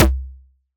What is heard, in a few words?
Kick, Drums; Bitkits